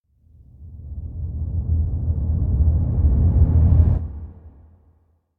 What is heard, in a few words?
Drone Low Deep Oncoming; Hollywood Edge; sound designer's toolkit v2